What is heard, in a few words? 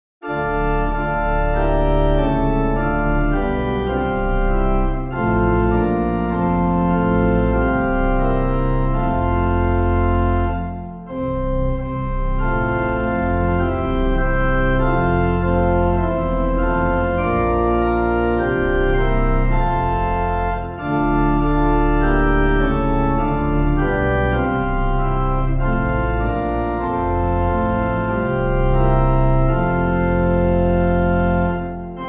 organ; Come Thou Long-Expected Jesus; Wesley; STUTTGART; Clyde McLennan